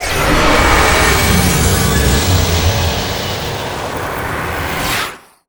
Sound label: Arena Crystal Skill; Gamigo